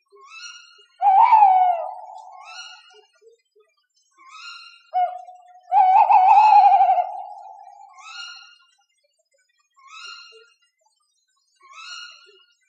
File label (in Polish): puszczyk